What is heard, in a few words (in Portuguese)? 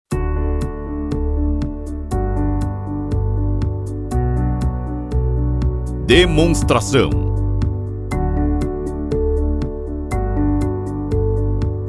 Trilha